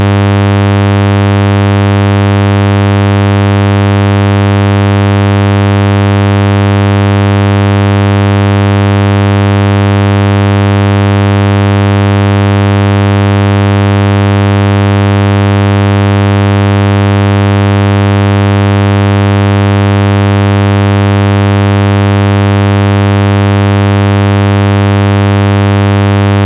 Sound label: sawtooth 100Hz